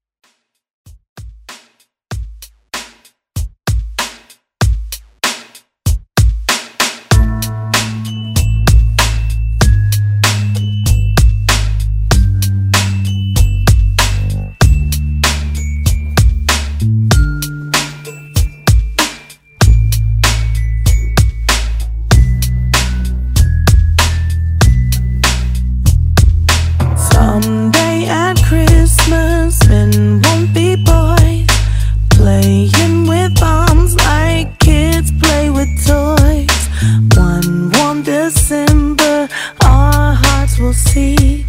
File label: Xmas Redrum